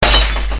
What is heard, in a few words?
broken glass